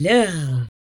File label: VOX, VOCALS; Ueberschall; Jam Box